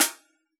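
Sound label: snare drum Jungle, Yamaha DJX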